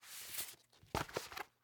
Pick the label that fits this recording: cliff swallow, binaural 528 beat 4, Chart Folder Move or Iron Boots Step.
Chart Folder Move